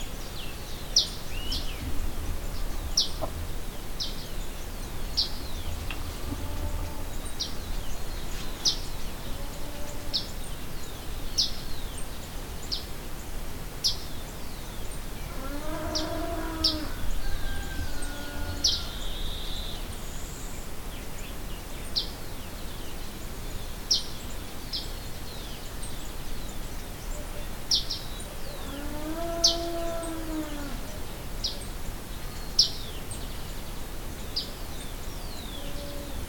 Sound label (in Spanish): amanecer en el Lirio, Cajamarca; Amanecer en El Lirio, Cajamarca, 2022